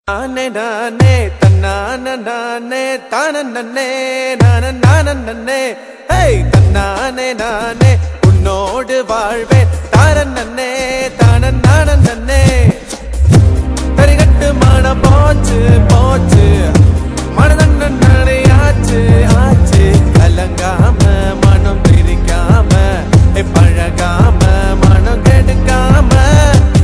Ring Tone; Vallavan

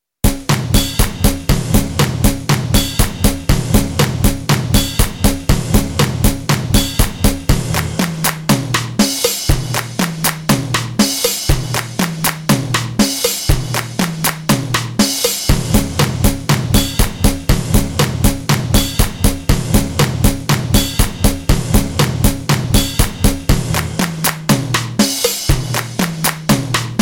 HipHop Bass Treble

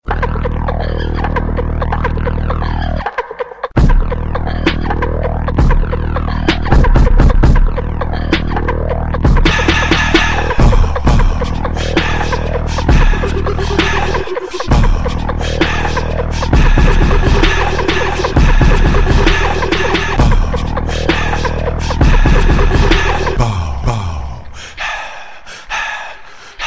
Bounce Remix

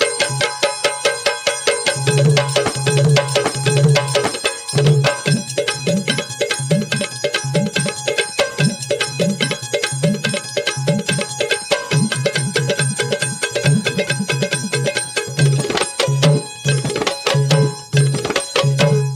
Tabla

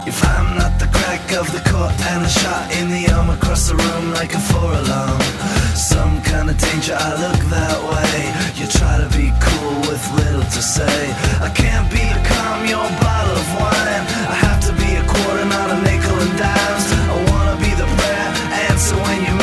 Custom Beat

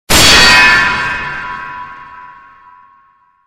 Deflect; Sekiro

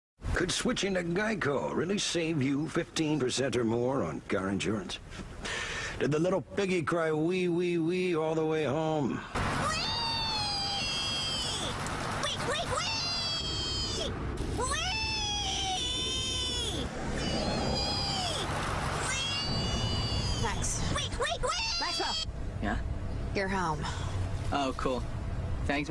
Weee

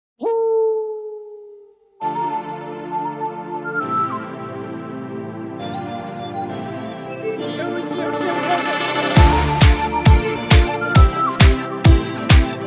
Armenian

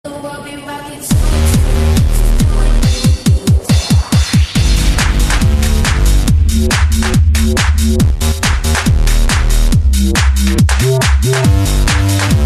BASS

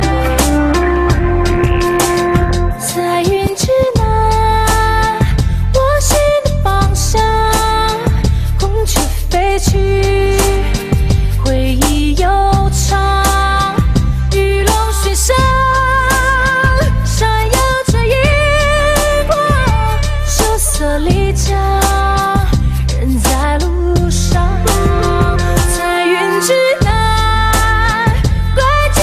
Chinese Romantic Son

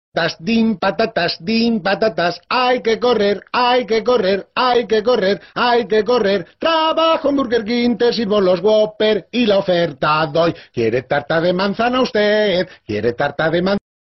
Ding

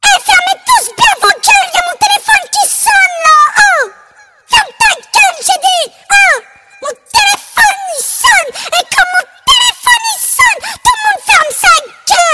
Lick; French

